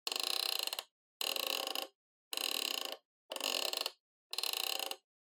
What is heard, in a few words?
Flip Clock Alarm Dial Mid